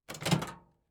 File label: microwave oven open